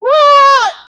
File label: cry of pain